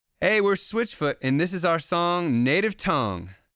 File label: Song Intro